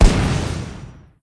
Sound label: Bomb Sound; candy crush jelly saga gamerip